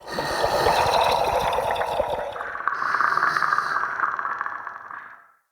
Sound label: Male Vocal Breath Light, Enemy; Mockery; Mockeries